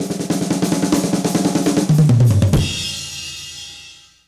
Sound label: Drum loops, Rolls